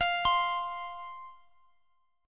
F 5th F Guitar Standard; arpeggiated; x,x,x,x,18,20; down; capo=3

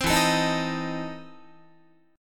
E Major 7th Suspended 4th Sharp 5th, Guitar

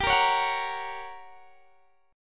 G Minor Major 7th Flat 5th G, Guitar, Standard; strummed; x,22,20,18,19,18

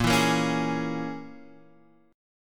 Db Minor 6th Bb

